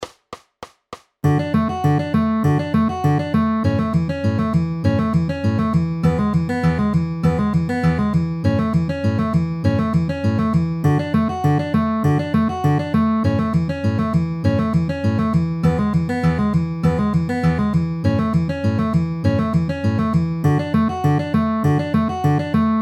D A E A downward arpeggio at 200 bpm

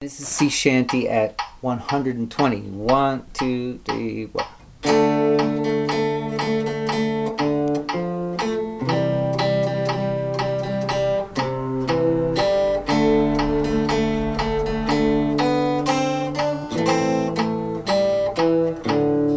sea shanty at 120 bpm

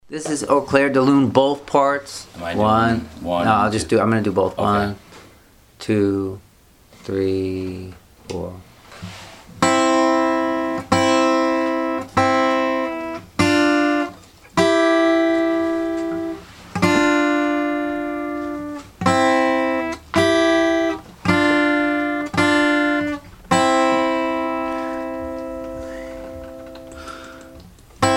reading playalong au clair de lune

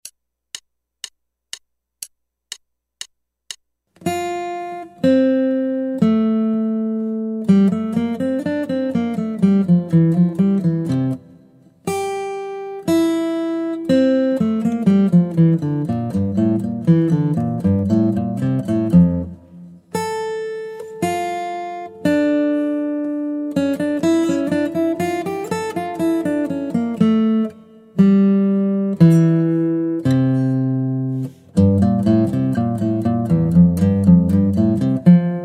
Guitar

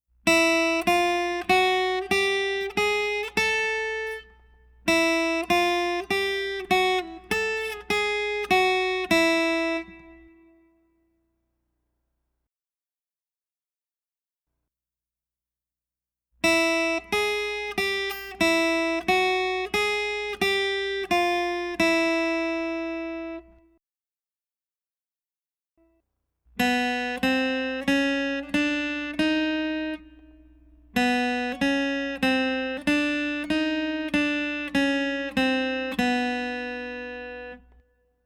Sharps 1st String Sharps Naturals 2nd String

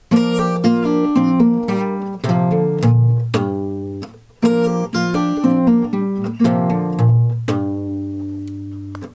e shape minor embellishment lick c, guitar

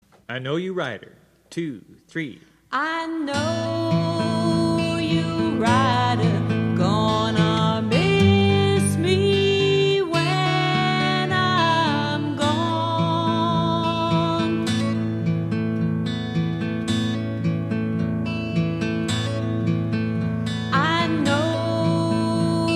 travis picking

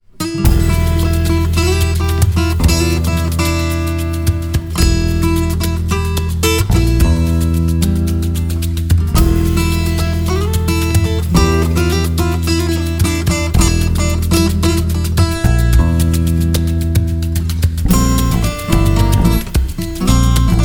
locrian

